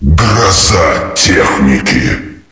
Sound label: knife; neonclub